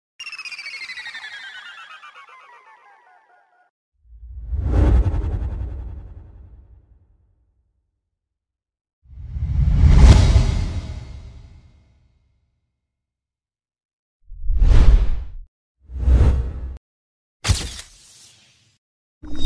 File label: Iconic analog hit